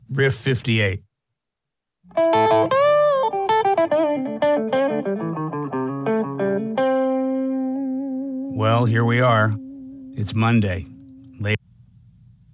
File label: Riff